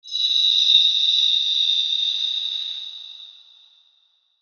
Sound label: CARRIER cell Idle